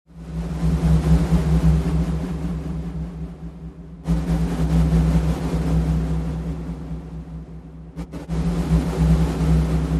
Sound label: Cosmic Radio Waves